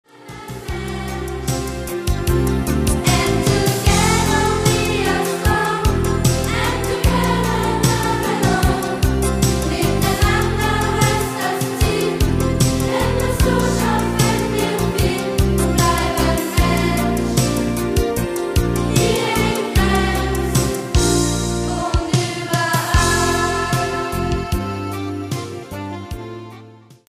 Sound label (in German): Schulhymne